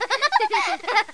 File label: Children's Laughter